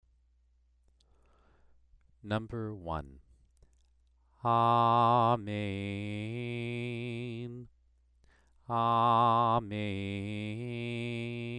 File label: Torah trope exercise